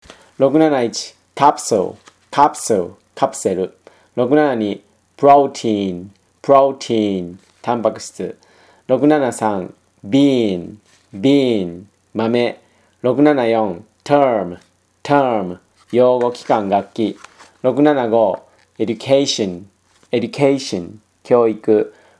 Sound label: tango